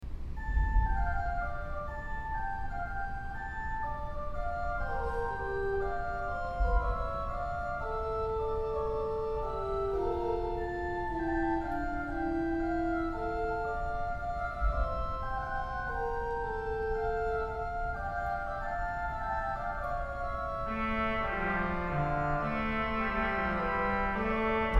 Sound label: Organ